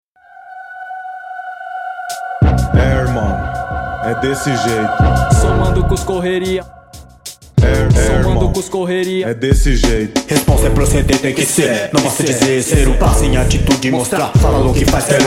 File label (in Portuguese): intro rap